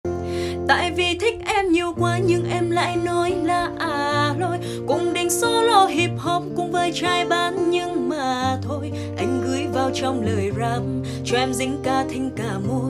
giong nu cover

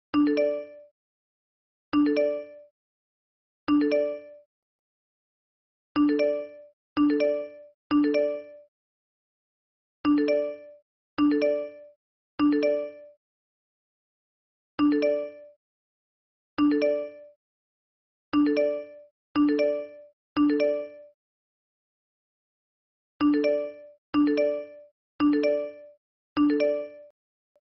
Nhac chuong tin nhan troll; zalo

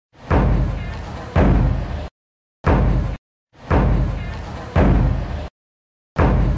Tieng trong truong tieng trong vao lop

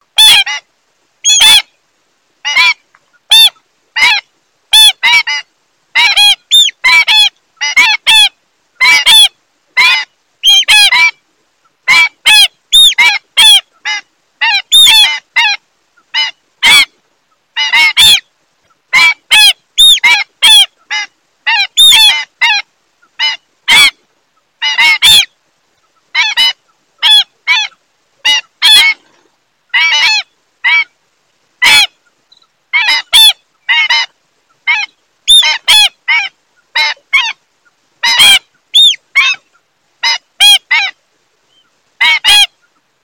Tieng chim Ca Kheo

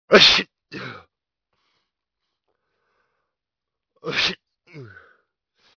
Tieng hat xi hoi

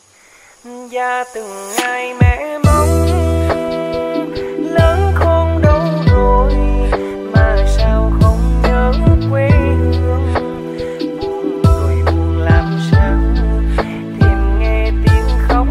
Nhac chuong lofi

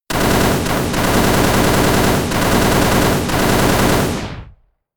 Tieng sung ban lien tuc

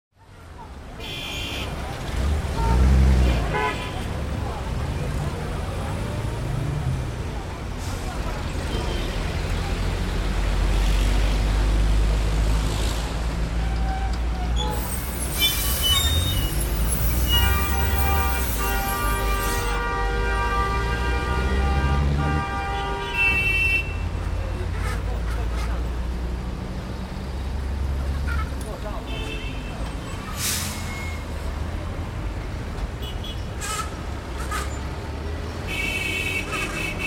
Tieng on ngoai duong

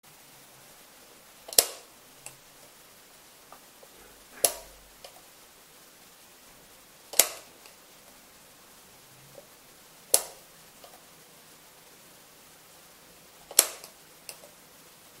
Tieng bat tat cong tac dien

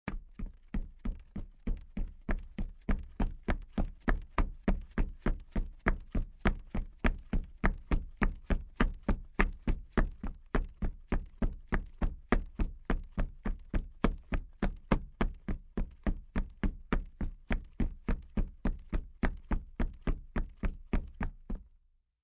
Tieng buoc chan chay

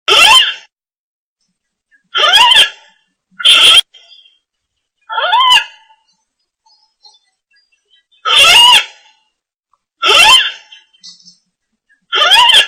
Tieng Nhai e

